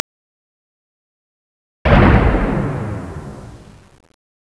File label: SHOT